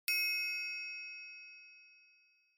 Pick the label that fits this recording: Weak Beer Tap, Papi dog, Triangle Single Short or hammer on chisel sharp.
Triangle Single Short